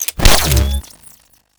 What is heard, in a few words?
charged shot start